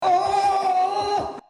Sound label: oooooh